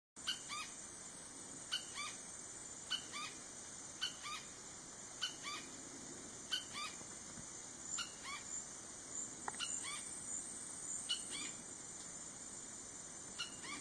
canto do Joao tenenem castanho

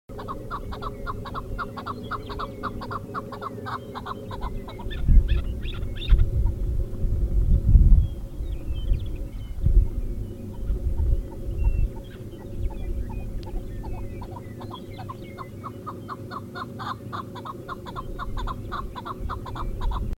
Canto do perdiz chukar

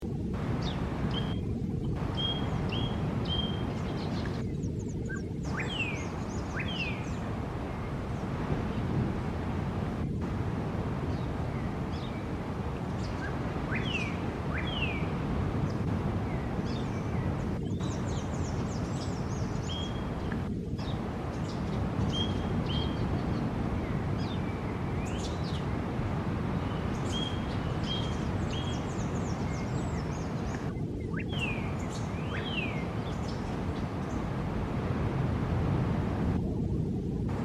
Canto do irauna de olho claro

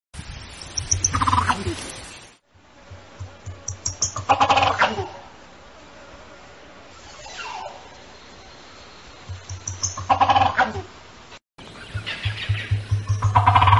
canto do peru ocelado

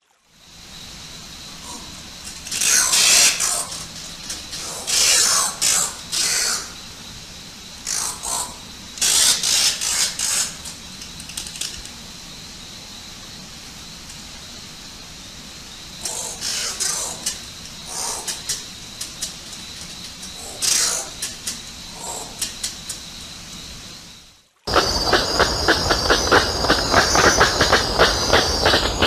canto do guacharo

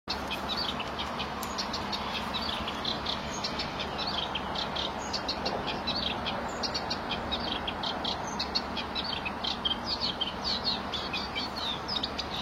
canto do picanco de coroa branca do norte

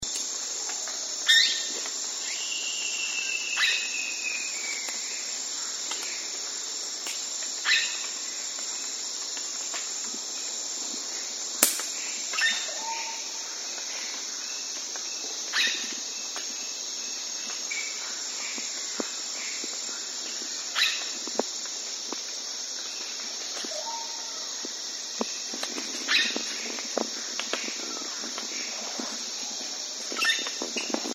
canto da curica urubu

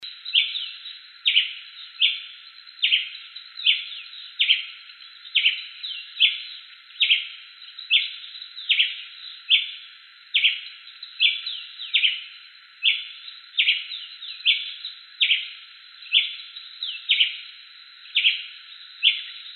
canto do tie preto

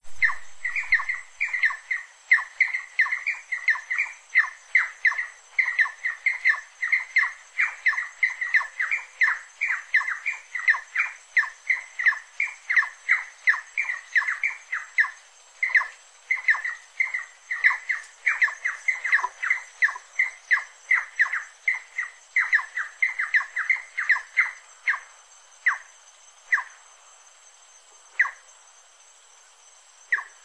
canto da saracura do mangue

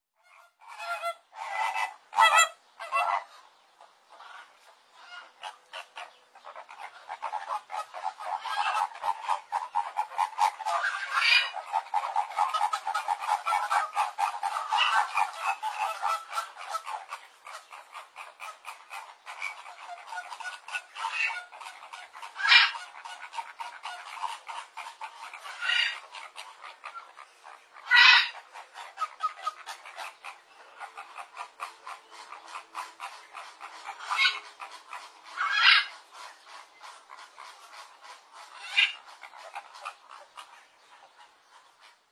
canto do flamingo andino

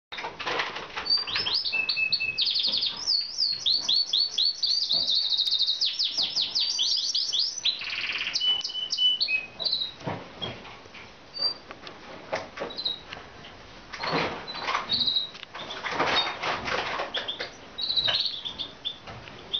canto do canario fife fancy

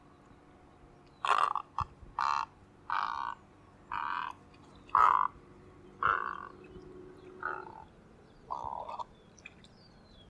Canto da Garça Branca Grande

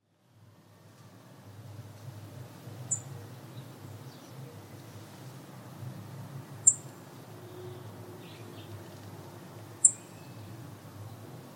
Canto do mariquita de garganta preta

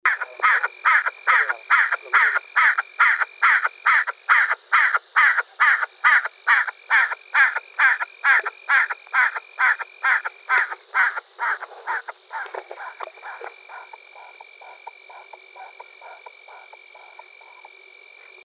canto do narcejao

